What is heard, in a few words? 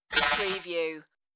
computer interface message